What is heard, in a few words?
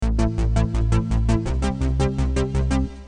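Prophet 5 polybass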